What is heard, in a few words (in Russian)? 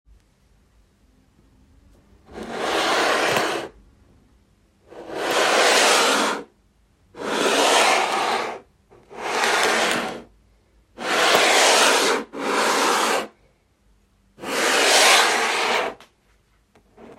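стеклянный стакан скользит по деревянному столу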